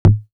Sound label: Low, Percs